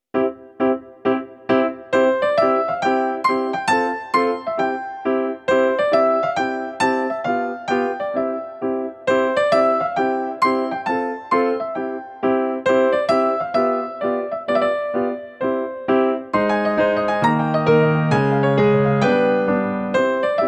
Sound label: Classical Carnivale